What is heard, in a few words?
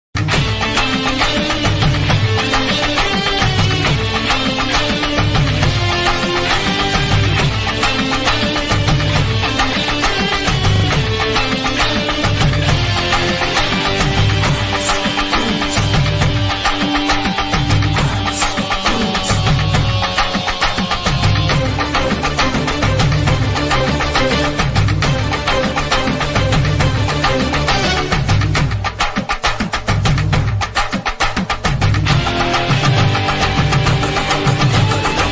ghilli bgm